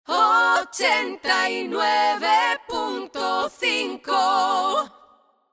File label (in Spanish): cantante singer, cantante dial 89 punto 5; cantantes para anuncios; Cantantes de publicidad